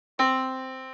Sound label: yamaha Disk